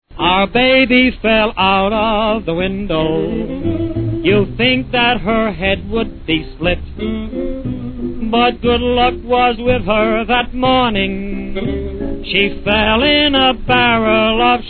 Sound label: Vocal